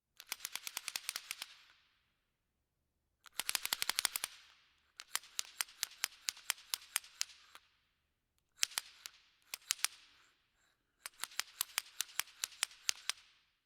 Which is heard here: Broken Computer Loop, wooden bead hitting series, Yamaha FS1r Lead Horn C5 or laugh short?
wooden bead hitting series